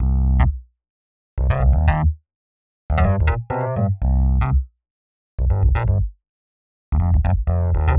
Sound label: Rhodes Bass C; PowerFX - Nu Jazz House